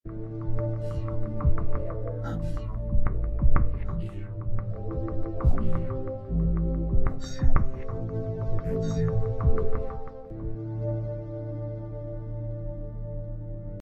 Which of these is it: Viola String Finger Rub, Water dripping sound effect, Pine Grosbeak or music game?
music game